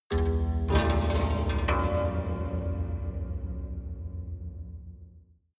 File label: haunted strings sequence low hard